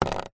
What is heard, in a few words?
nature water drips sudden short cardboard